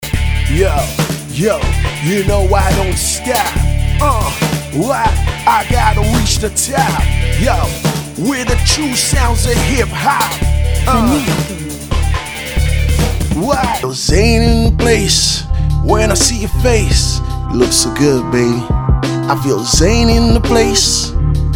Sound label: Raw Hip Hop Vocals